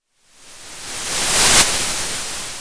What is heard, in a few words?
Sound of waves crashing on a shore